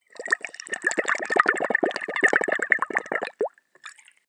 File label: bubble fast